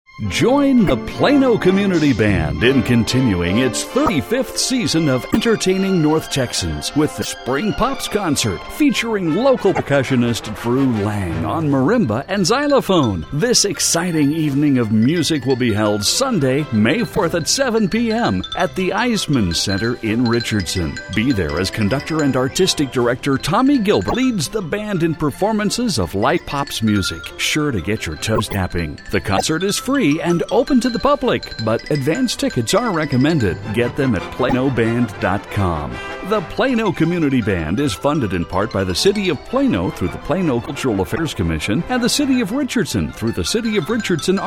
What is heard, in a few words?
Community Band Spring Pops Concert; Plano